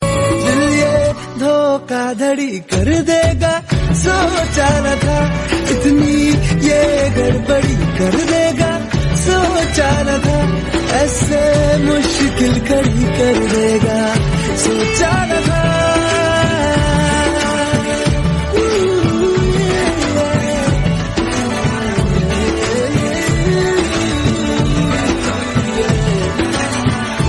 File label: Hindi Ringtone